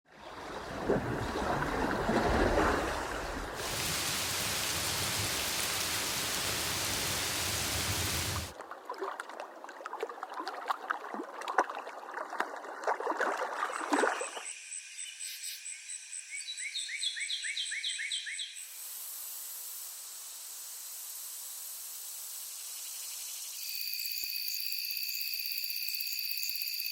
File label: ambient earth nature loops sound fx